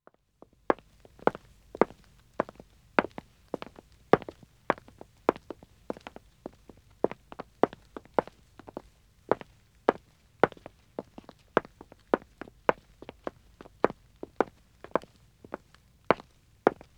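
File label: Wood (Dead Acoustic) Walking 2 men, Footsteps; BBC Sound Effects Library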